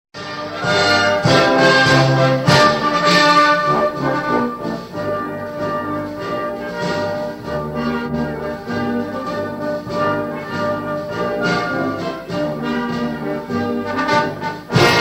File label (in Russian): гимн чечни музыка